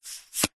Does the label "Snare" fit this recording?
No